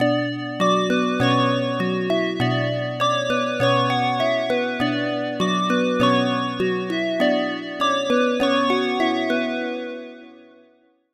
Key, Sylenth1; Routed Sound; Sylenth1 Expansion - Street Vanquisher Vol.1

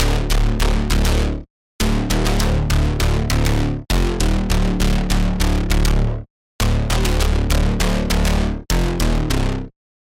Bass; Vile Taint; Routed Sound; Serum 2 Expansion - Doom Carpenter Vol.1

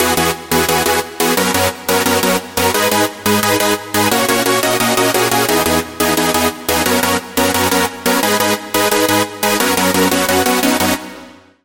Chord; Routed Sound; Sylenth1 Expansion - Flame Summoner Vol.1

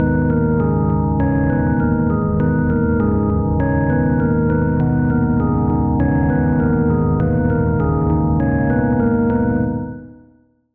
key, Sylenth1; Routed Sound; Sylenth1 Expansion - Street Vanquisher Vol.1

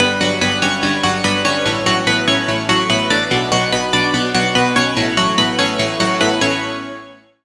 Pluck, Serum 2; Pluck - Mechanical Monk; Routed Sound; Serum 2 Expansion - Reality Maker Vol.1

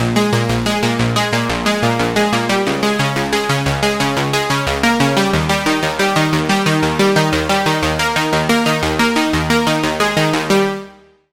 Pluck, Sylenth1; Routed Sound; Sylenth1 Expansion - Star Scavenger Vol.1

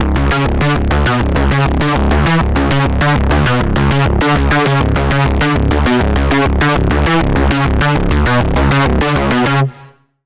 Bass, Sylenth1; Routed Sound; Sylenth1 Expansion - Doom Carpenter Vol.1

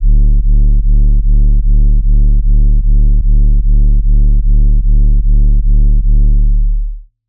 Sub; Sub - Basic Chaos; Routed Sound; Serum 2 Expansion - Chaos Bringer Vol.1

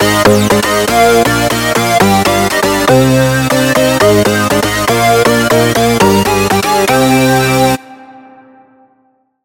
Lead; Digit Flag; Routed Sound; Spire Expansion - Hyper Gamer Vol.1

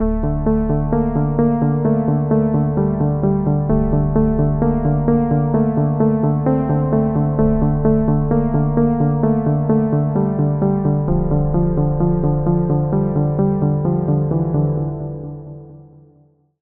Key; Routed Sound; Spire Expansion - Reality Maker Vol.1

